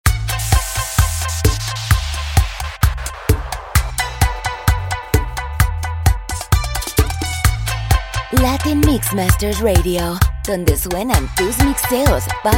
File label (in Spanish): BACHATA MIX